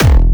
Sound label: Kick E 75 BPM